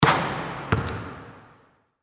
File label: basketball hits rim